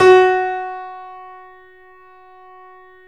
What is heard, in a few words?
3D Pianos Yamaha C7; E-MU Producer Series Vol. 5